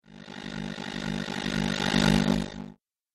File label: Slow Rough Cut Whoosh; Whoosh Sound Effects